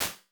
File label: Early Reflections Intimate Space, Impulse Responses; Ableton Live 12 Lite